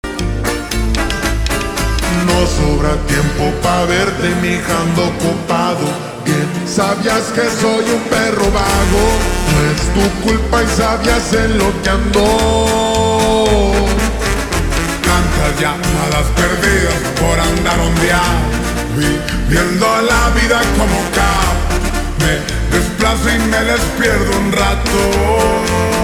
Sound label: Ringtone Slowed Reverb